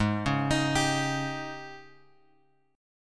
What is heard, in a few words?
G sharp Major 7th Suspended 4th; G#M7sus4 Chord {4 4 X X 4 3} arpeggiated